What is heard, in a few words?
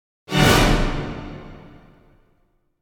Symphonic Hit Scary Appearance Emergence Chord; Hollywood Edge; sound designer's toolkit v2